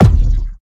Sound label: Player Head Collide